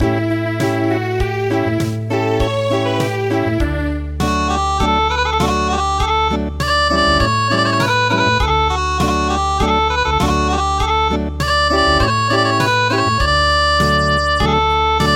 Acoustic, traditional; The beautiful Gigogin

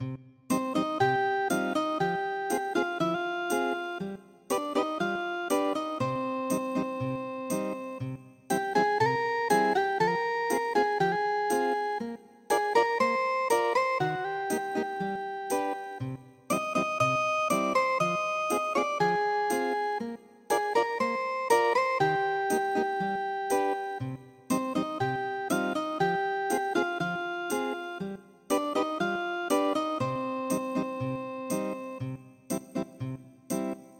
ethnic guitar; OGONEK

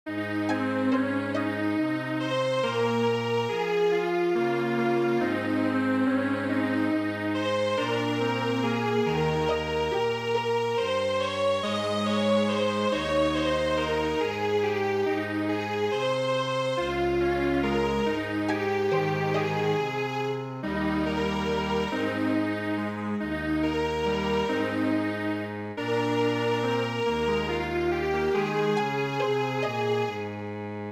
Lullaby

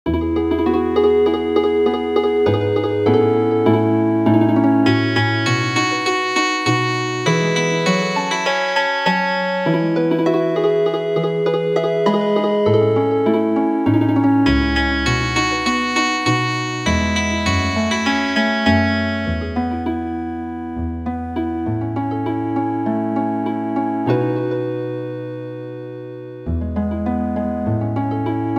Healin Harp

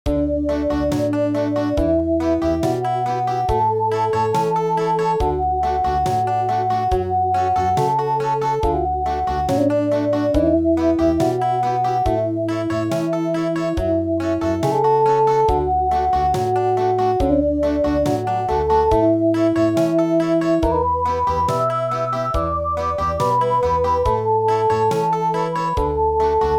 Country, midi

